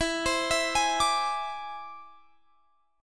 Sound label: E Augmented 7th E down Guitar Standard; E+7 arpeggiated; capo=3